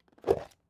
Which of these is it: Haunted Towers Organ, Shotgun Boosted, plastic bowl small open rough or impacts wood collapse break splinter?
plastic bowl small open rough